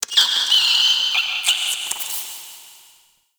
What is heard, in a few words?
Champ Crypt Rat Vocal; Ambience; One Offs; Champion Crypt